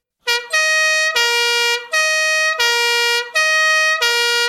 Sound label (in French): son pompier, klaxon